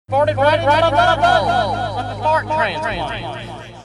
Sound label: fart transplant drop played echo reverb